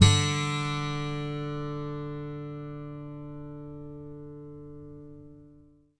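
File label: GTR D2 12; Synclavier Sampler Library; KEYS AND GUITARS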